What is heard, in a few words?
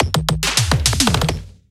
Riddim Drum Fill 140